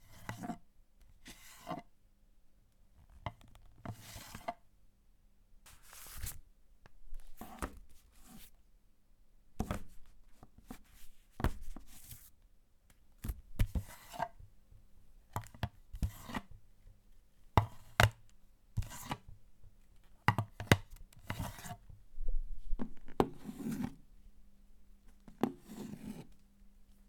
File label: Picking Up Cellphone From Table